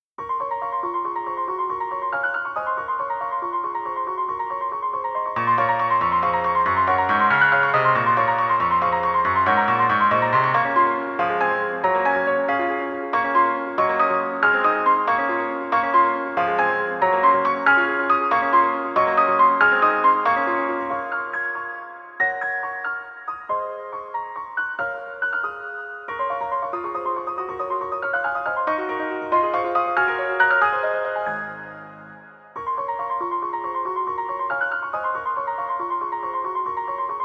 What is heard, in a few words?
piano; pain